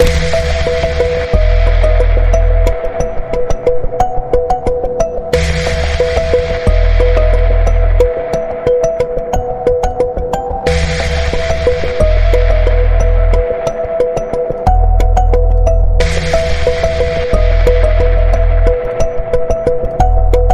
Clear Beginnings Pulse, E

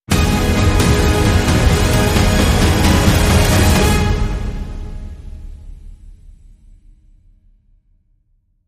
Sting No Choir

